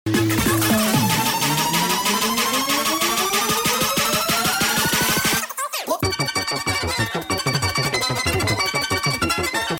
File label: bass drop